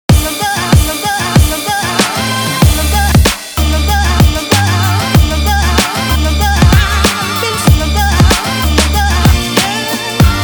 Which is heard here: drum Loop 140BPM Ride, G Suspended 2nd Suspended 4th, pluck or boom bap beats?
boom bap beats